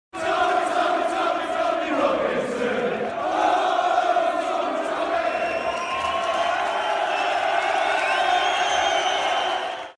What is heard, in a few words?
chant; Tommy Robinson